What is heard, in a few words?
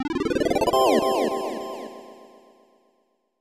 Goal; Hansei Zarujirou-kun no Daibouken; Spanky's Quest; snes